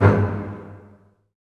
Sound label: Bss Spic G#1; Digital Sound Factory Virtuoso